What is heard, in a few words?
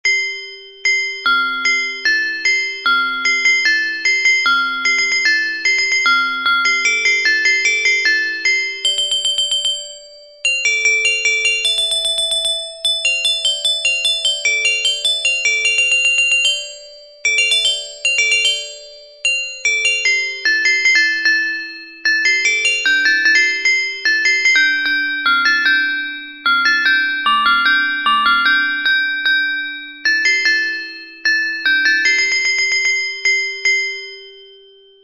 gamelan